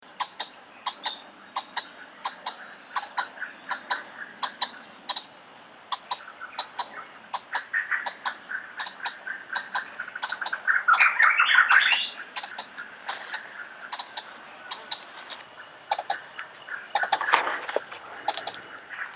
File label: Paraxerus poensis; Kakum Ghana; Daan Drukker